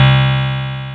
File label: DIGI WAV, INST WAVES